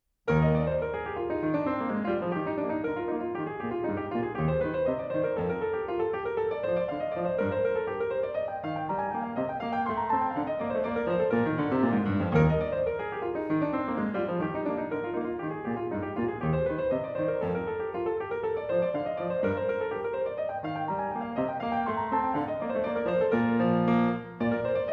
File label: French Suite in E Flat, Air; French Suite No.4 in E Flat, BWV 815; Vladimir Ashkenazy; Bach French Suites, BWV 812-817